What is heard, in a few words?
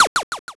shot